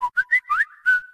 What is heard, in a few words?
whistle; whatsapp